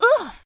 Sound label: ooof; pauline; SM64 CO-OP